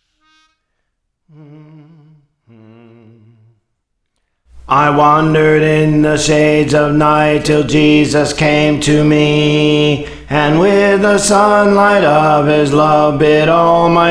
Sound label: Bass; Praise For The Lord Training